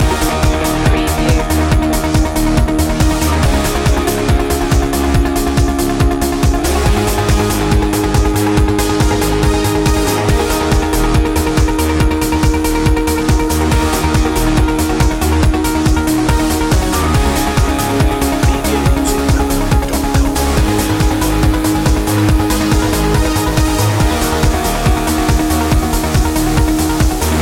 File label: mystic loop